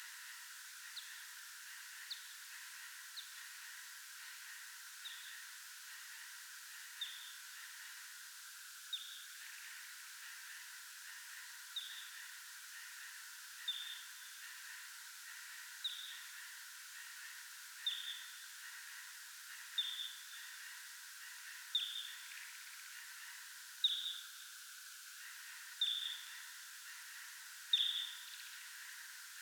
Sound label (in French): FM sigmoide P kuhlii FM sigm; Correze; J-Vittier